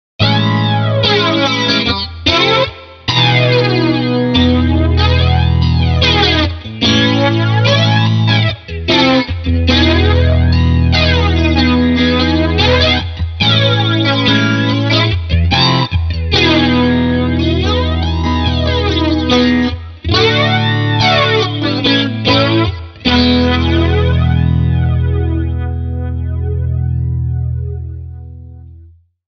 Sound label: flanger; Yamaha CPX1000 + APX1200